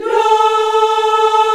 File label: VOX, African Aahs, African Las